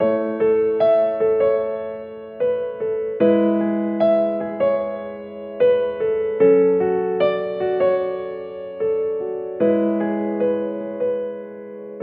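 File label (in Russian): romanticheskiy rington, romantic ringtone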